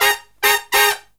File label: Brass Riffs; USB Soundscan vol.29 - Killer Brass Riffs; AKAI